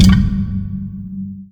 fs fm lightbridge, footsteps; player; Portal 2

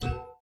lobby notification joined; Counter Strike Model Pack; CS:GO

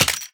weapon pistol impact; physics; weapons

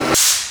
dog pneumatic; Half Life 2

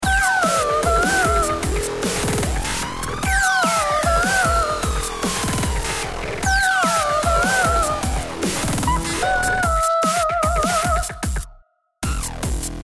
start action, music

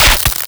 spark short

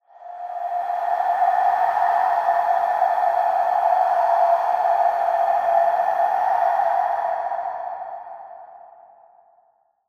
ambient skull breath; ambient air; Half Life Alyx

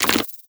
walk, antlion; creatures

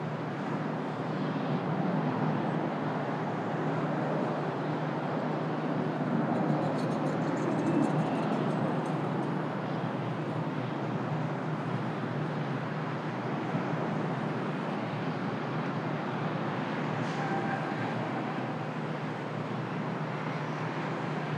exterior, ambient; nuke

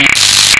rmine shock vehicle, roller mine; npc

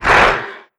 grunt soft, antlion; creatures; vox

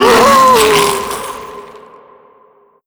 death, zombie vox; creatures

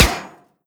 metal sheet, bullet impacts; physics; Half Life Model Pack

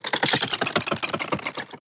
manhack death warbling; npc; Half Life Alyx

